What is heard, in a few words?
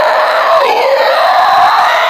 lunge scream loop; hunter; attack